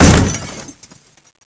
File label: car impact